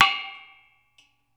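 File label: PANARUS, Metalophones, Pitched Percussion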